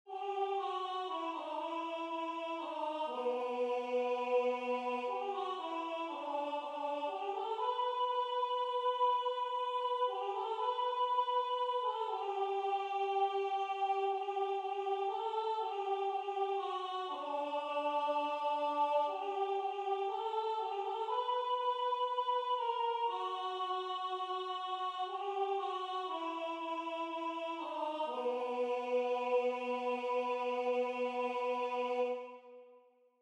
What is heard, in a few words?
Alto; JS Bach; Baroque